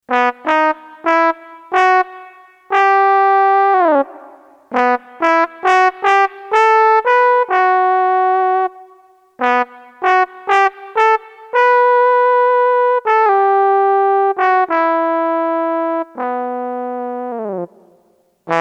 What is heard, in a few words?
trombone